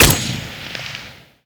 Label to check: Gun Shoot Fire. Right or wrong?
Right